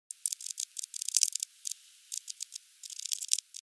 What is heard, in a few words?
calling purring